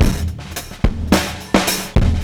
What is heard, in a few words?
Boom Boom, Breaks; Zero-G; Beneath The Planet of The Breaks